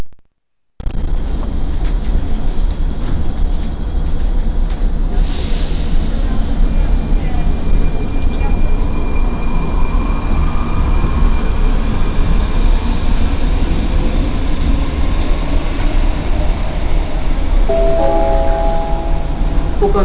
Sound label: Metro, Prag